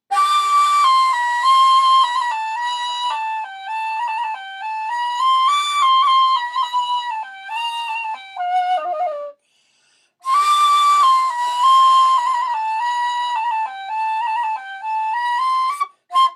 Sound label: Dulab Hijaz